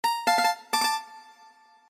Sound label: New-age Ringtone